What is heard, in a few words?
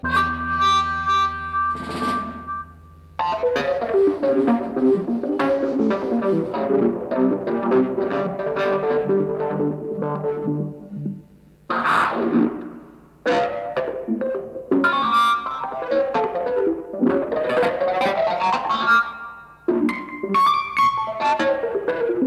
Electric Piano Solo